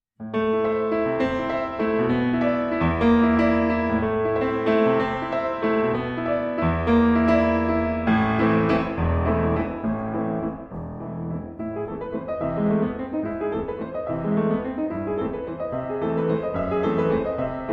Waltz

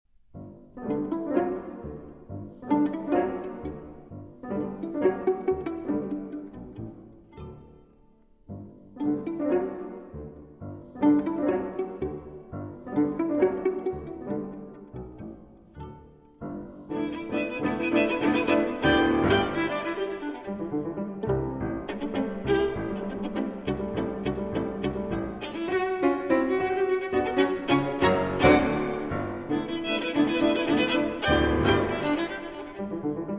violin and piano; Minstrels (Nerveux et Avec Humour)

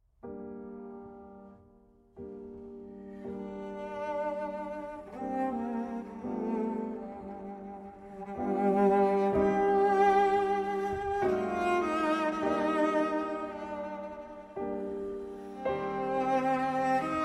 for Cello and; Arr. for Cello and Piano

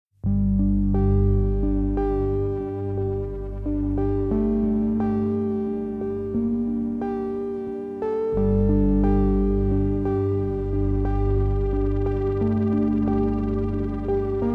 Instrumental

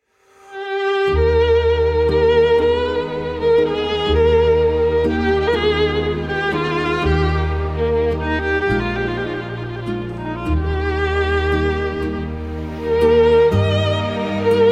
Sicilienne For Violin and Orchestra; Paradis; Arr. Garrett Van der Heijden

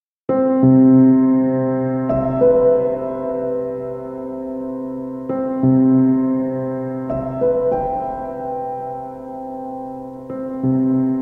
Piano Sounds

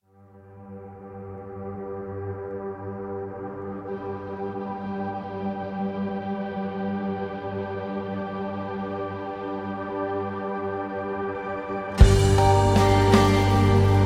Instrumental

